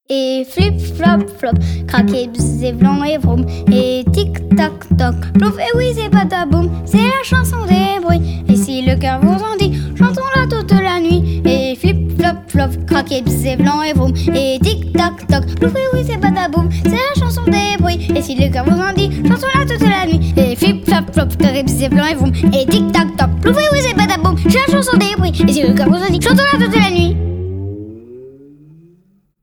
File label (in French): Flip Flap Flop